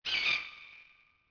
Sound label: hawk; Ambient; MX vs ATV Unleashed